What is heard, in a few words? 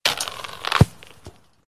Soccer goal net hit